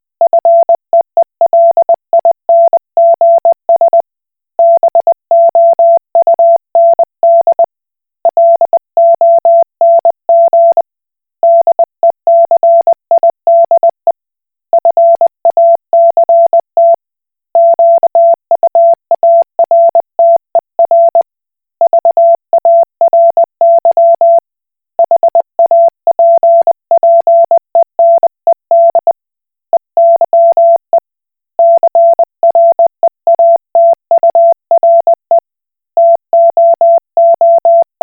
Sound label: Koch Morse